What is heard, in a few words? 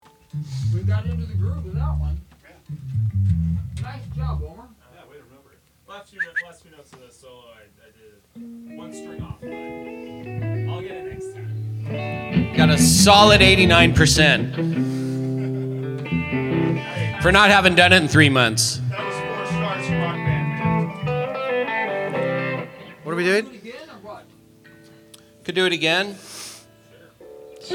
Vocals and Background